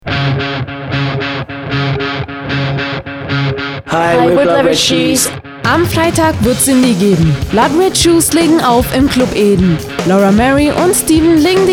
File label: jingle